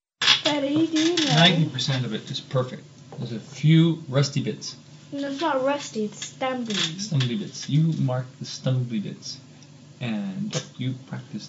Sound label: Piano-Forte Mood